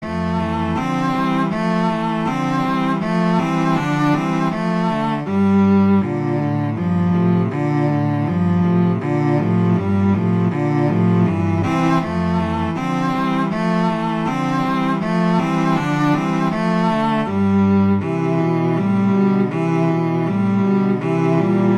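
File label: Etude, cello; Popper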